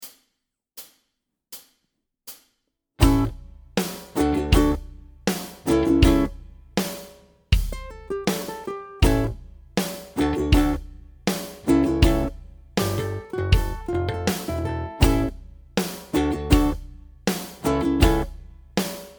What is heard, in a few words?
Groove Workshop, ukulele